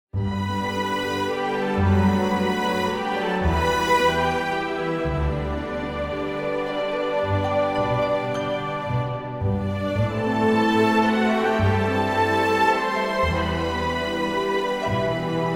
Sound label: Romantic Treacle